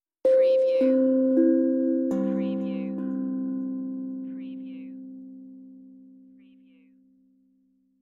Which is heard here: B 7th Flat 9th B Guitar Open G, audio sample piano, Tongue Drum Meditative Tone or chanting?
Tongue Drum Meditative Tone